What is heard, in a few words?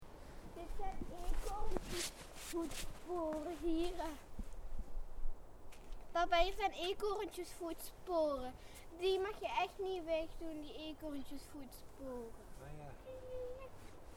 Building snowman; daughter build a snowman in the backyard, Copenhagen, Denmark on 2nd January 2025; Joyce de Badts; Field Recordings